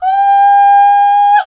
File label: Mex F Vox, Vocals; Roland BK-5